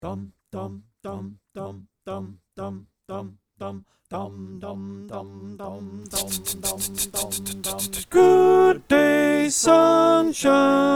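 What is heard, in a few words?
alto, choir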